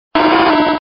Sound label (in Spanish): Grito de Quagsire